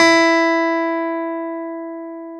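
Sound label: clavinet; Roland LCDP10 Keys of the 60s and 70s 2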